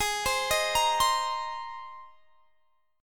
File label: Ab Major Add 9th Ab; Abadd9 arpeggiated; x,23,22,20,23,20